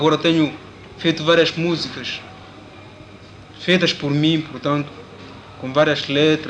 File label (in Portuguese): Cantar e Pintar; Mocambique